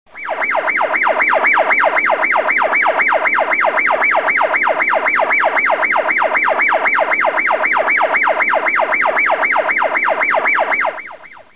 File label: warn